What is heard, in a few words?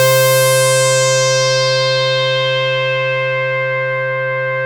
MC 202; Masterbits; Vintage Voltage